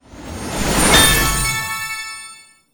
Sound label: ui quest cup win hard